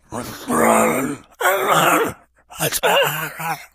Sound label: mumbling, infected idle; npc; source engine; left 4 dead 2